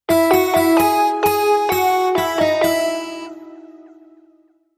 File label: Ad Perfect Sting